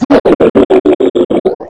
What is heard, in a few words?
death; quake2; cero